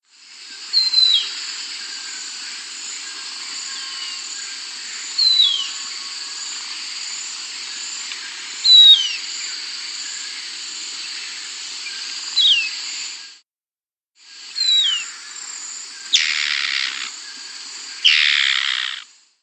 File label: White-Plumed Antbird, S. America